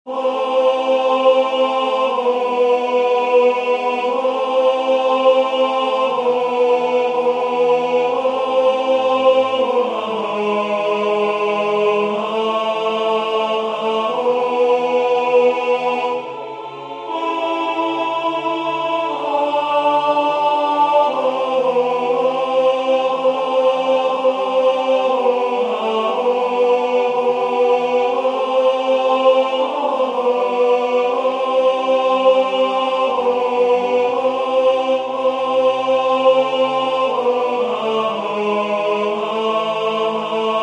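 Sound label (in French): tenor, Musique sacrée; Jesu dulcis memoria; Victoria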